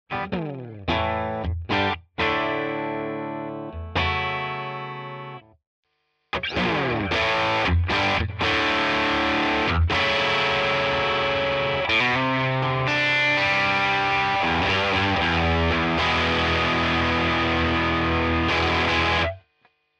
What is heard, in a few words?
Walrus Fundamental DISTORTION